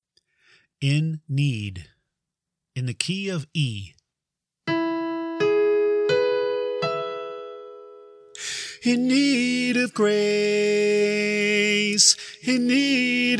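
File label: In Need Tenor, choir part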